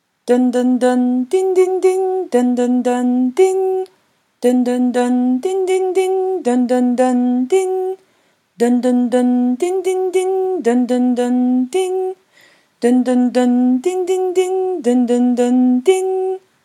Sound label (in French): cloche